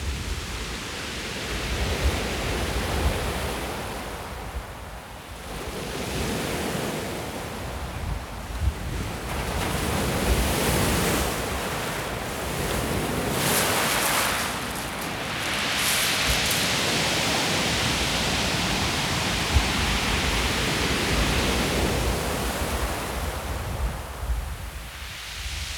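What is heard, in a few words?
windy day on the seashore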